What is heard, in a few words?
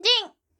Head Bounce